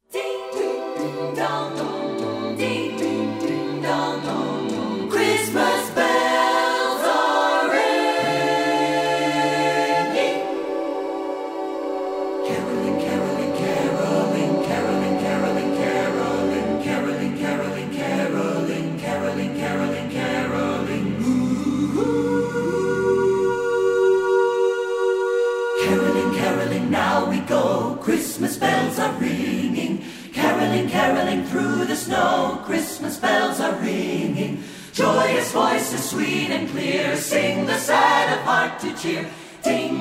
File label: Caroling